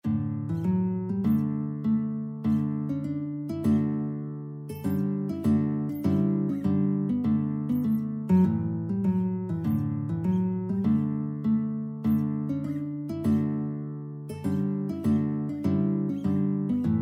guitar

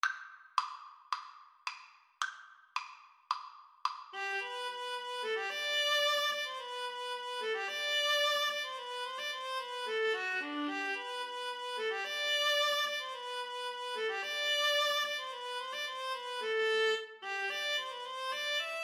viola duet

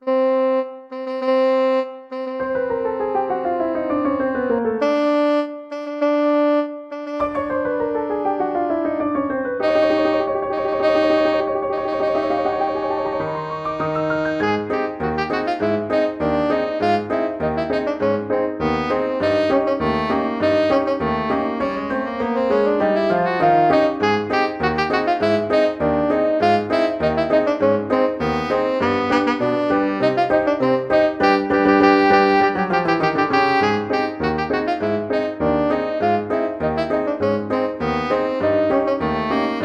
entry of the gladiator, tenor saxophone

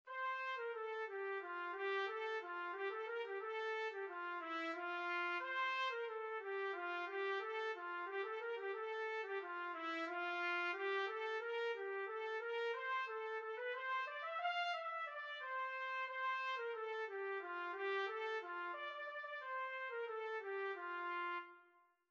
deck the halls 4TPT, trumpet quartet

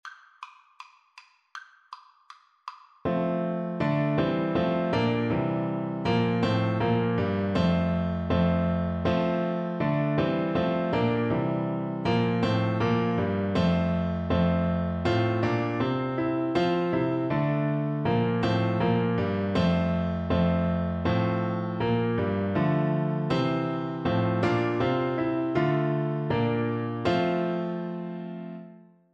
good king, Tuba

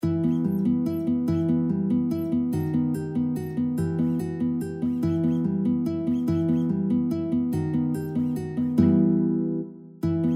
waltz in em, guitar; carulli

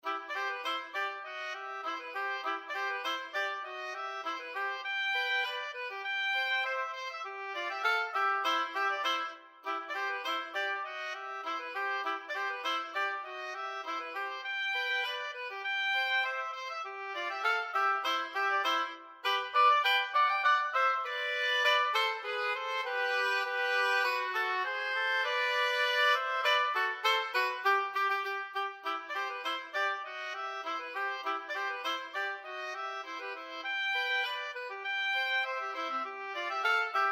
2 oboe, oboe duet

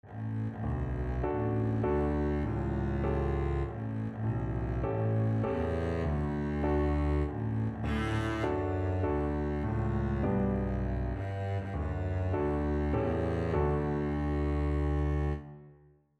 happy birthday, double bass

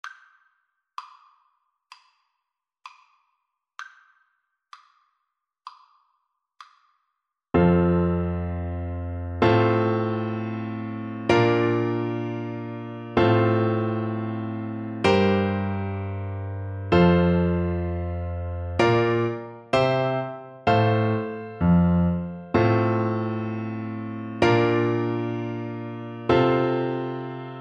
horn, French Horn; Slow Playalong

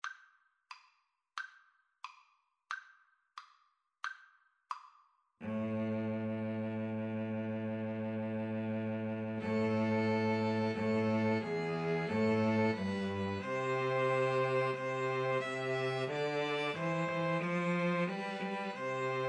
capriol pavane, String trio; Band Playalong

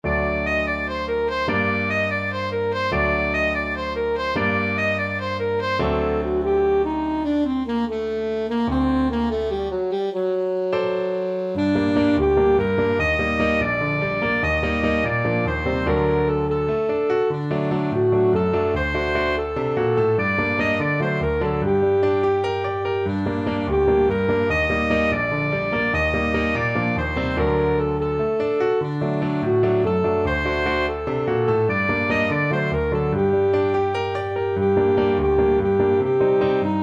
ASAX, saxophone

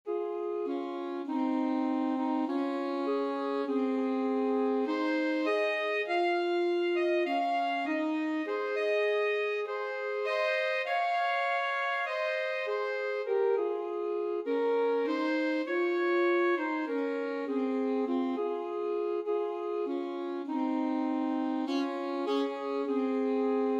alto saxophone duet

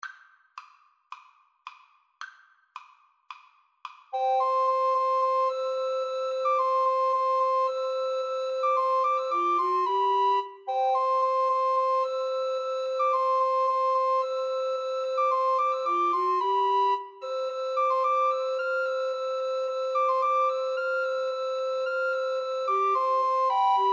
Recorder Trio

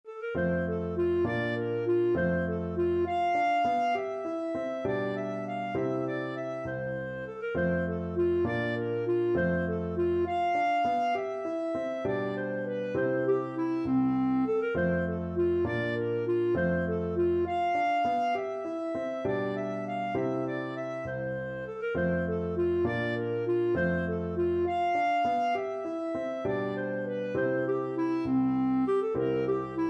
Gigue G Major, clarinet

